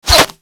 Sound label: Bullet Default Hit; Warframe